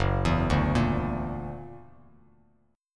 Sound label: F sharp Augmented 7th, Bass Standard; arpeggiated; JGuitar